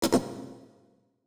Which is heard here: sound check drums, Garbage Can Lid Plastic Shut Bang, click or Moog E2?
click